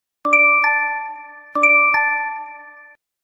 Call line

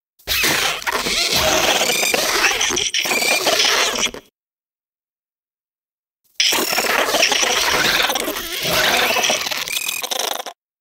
Tarantulas

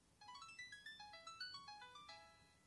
qtek ringtone

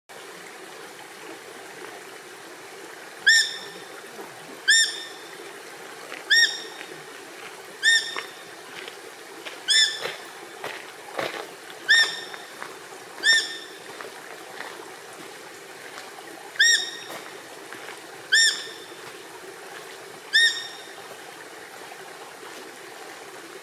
Eurasian otter; شنگ اوراسیایی

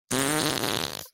fart

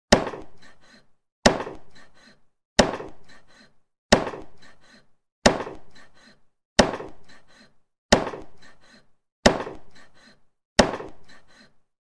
Meat Chopper Knife Sound Effect

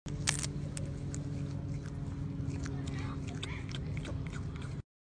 quokka; کوئوکا

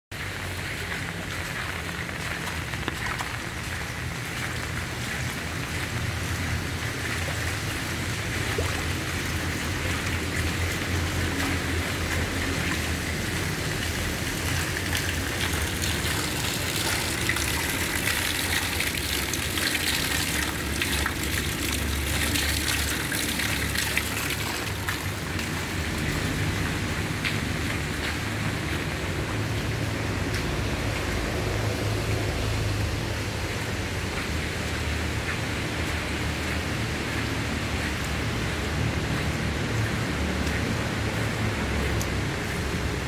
Running in rain

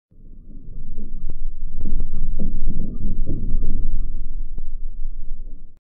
basement

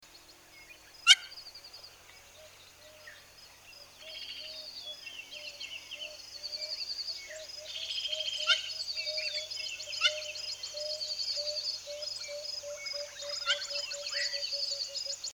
Hamerkop, پرنده سرچکشی

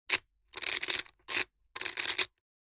Poker Chip

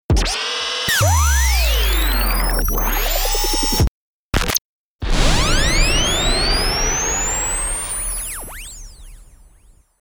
laser cannon science fiction sound

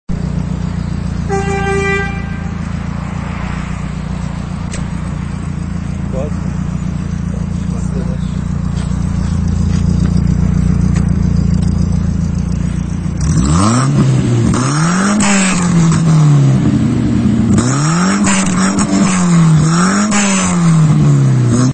jumper exhaust

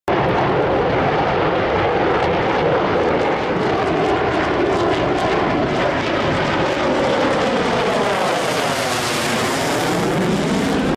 Chengdu J 20; جت جنگنده چینی

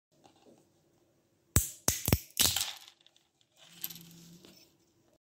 cracking walnuts; شکستن گردو

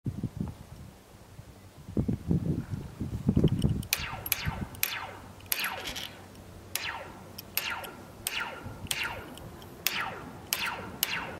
Metal wire

